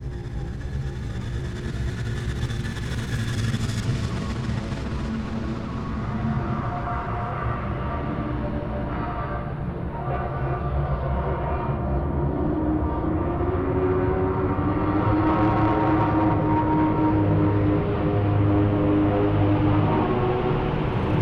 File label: Abstract Ambience